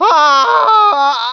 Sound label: scream